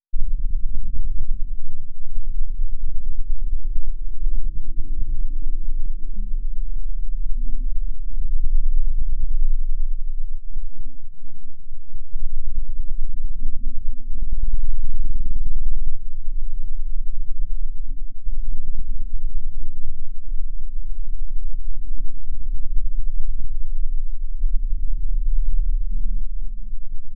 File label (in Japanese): 絶頂スイッチ～12Hz β波 α波 θ波